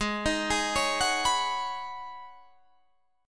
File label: Ab Minor 11th, Ab, Guitar, DADGAD